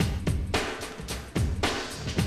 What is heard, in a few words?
110, Classic Drum Loops